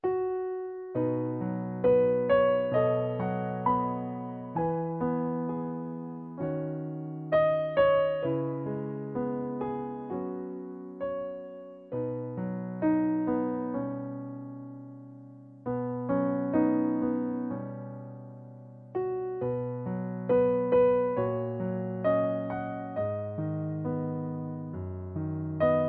背景音感人

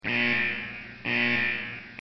最后一波; 怪物来袭

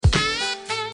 点点点 单次

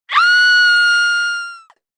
女生惨叫

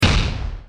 扑克牌命中爆炸; 武器音效

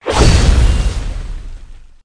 挥刀带风; 武器音效

